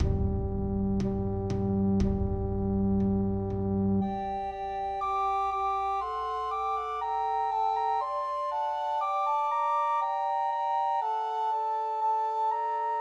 Pat a Pan, Christmas Trios for Two Descant and One Treble Recorder